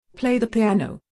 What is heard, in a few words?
play piano